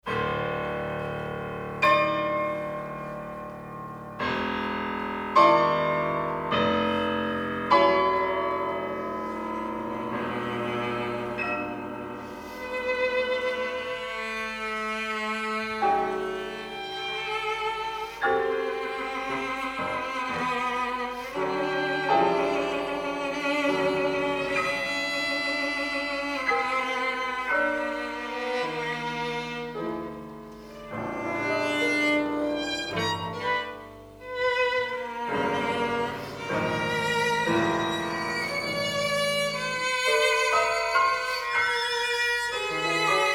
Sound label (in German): Klaviertrio Ausschnitt